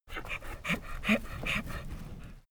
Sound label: donkey grunt snort